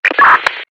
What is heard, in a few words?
Roger Beep